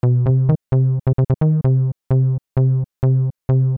Loop

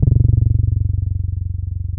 Syn Bass; Stone

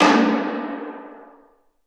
Taiko Drum

